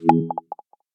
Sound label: NFC Transfer Initiated, ui; Android P